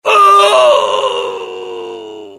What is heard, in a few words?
death; mohaa; dialogue